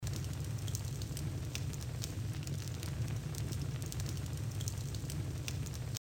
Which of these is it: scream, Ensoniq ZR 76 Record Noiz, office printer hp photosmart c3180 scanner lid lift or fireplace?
fireplace